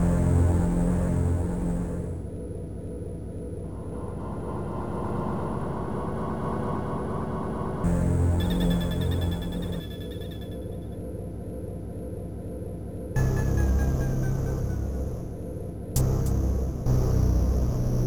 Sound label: Inner City Ambient; Perihelion The Prophecy; Amiga